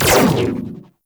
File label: Burst Laser Pistol Prime Fire; DE; Warframe; Weapons; Sentinel